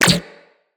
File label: Shield Impact Default; Warframes; Volt